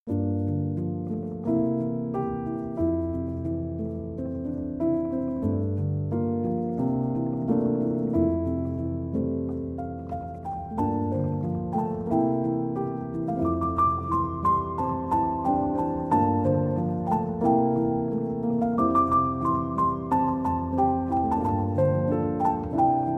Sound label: Piano Instrumental